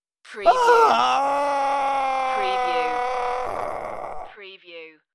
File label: Man In Pain